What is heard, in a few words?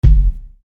Bass Dr, EuroDance Bdrums